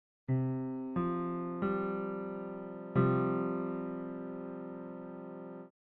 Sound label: Csus4